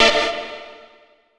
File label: NRG Pad